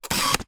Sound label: Camera Single